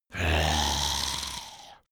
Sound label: zombie moan idle